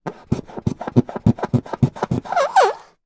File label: polystyrene block rub finger squeak; foley; zapsplat